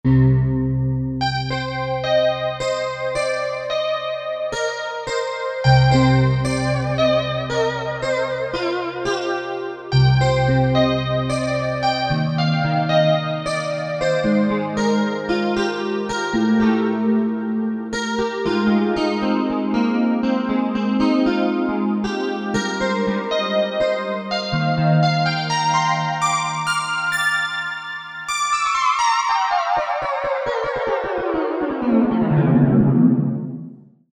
S&H WAVE, Synth; Synth 1

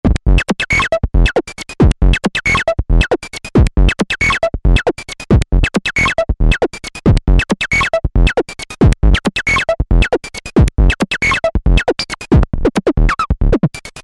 beat bass, synth

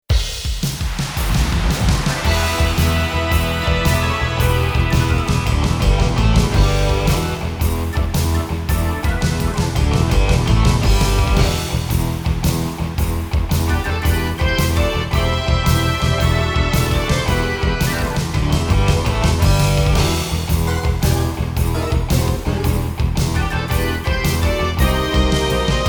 Rock Show; Korg 01R-W; Factory Songs

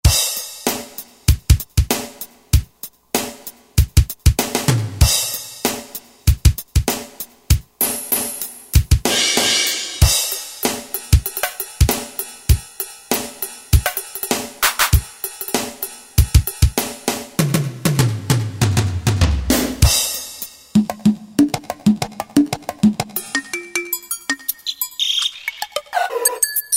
Drum Set A, Roland JD-990, Rhythm Set; Factory Patches

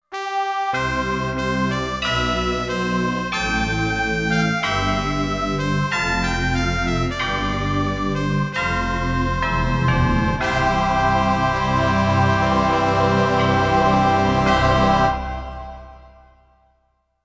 Glorious!, Alesis Z3; Sanctuary

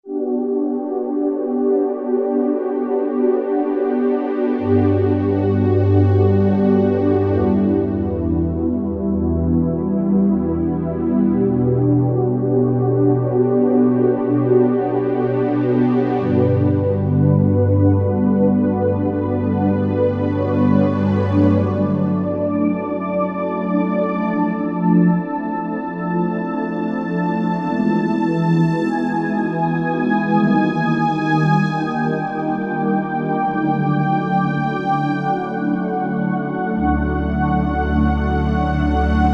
Nightchoir

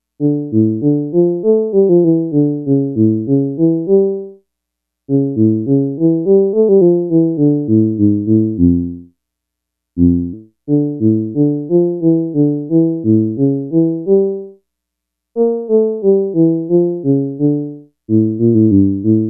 Bassoon

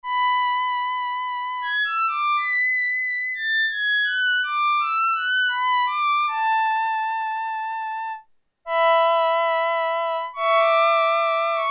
Solo Violin; Factory Presets Bank B

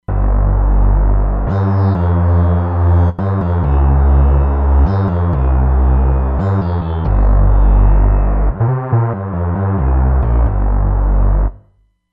Lo Bass, Roland Fantom XR

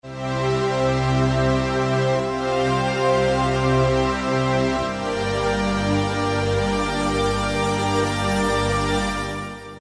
Deep String Ensmbl, Roland PN-D50-01

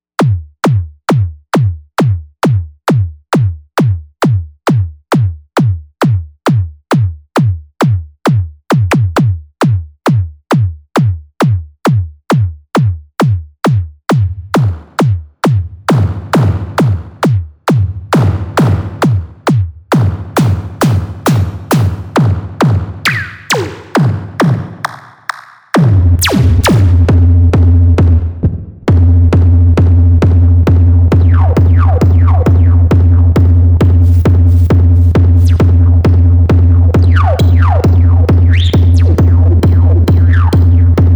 Attack Kick, Novation UltraNova; Factory Patches

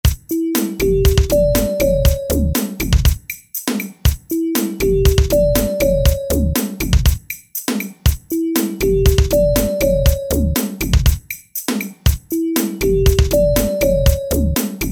Techno

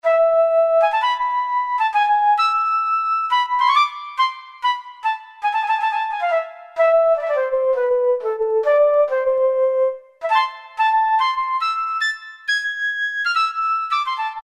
Flute, Yamaha TG33; Factory Presets

